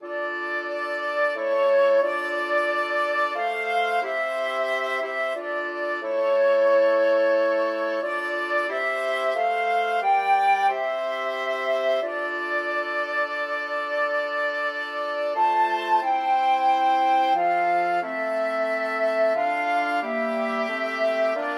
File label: flexible mixed ensemble 4 players